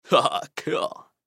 Cartoon Voice Male- Surfer Laugh Cool; Voice Clip Male Young Man Exclamations; Cartoon Voices

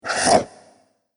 Monster Swift Chomp; Monster Sound Effects

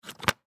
Flute Case Close; Woodwinds; Blastwave; Sound Effects:Musical

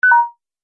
Multimedia Event Notification, beep sound effect

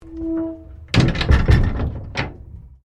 Train Diesel X Class 1951 Cabin Door Close; Tasmanian; TGR; Bellarine Tourist railway vehicles; Trains

